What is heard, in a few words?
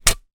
industrial shovel metal dig dirt soil; zapsplat